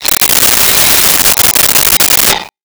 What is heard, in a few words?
Radio Hit Digital Bull Horn; Transitional Elements; 1001 Sound Effects